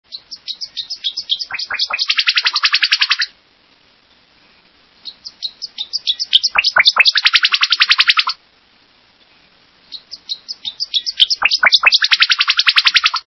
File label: Tennessee Warbler